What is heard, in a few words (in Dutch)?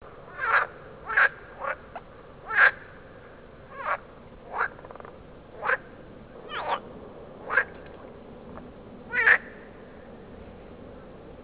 meerkikker territoriumroep